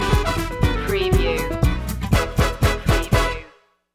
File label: gypsy swing stinger